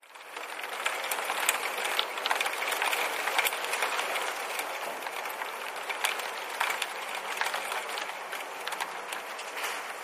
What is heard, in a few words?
rain on windowsill